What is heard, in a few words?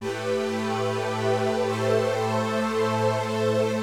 SYNTHPAD, HOUSE, 125, A; eJay; Sphere